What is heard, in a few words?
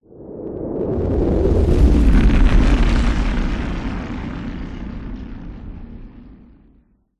whoosh rumble rocks; sound design; zapsplat